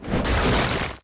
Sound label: fire win; Ben 10 - Heatblast Fight